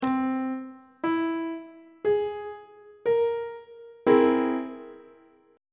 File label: c augmented 7th chord; on treble clef